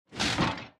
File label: recycler trash; Factorio